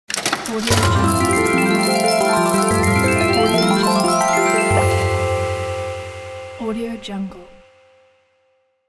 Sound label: magical jackpot